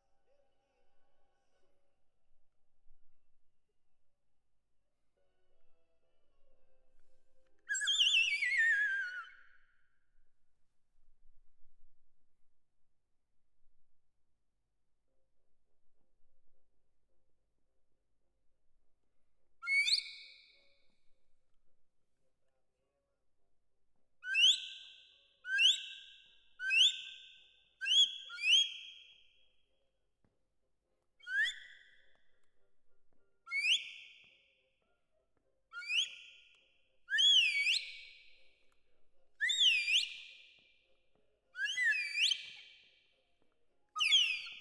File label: Perc Close